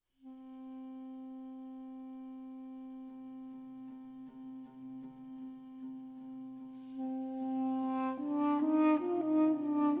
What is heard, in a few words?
Chant from a Holy Book Instrumental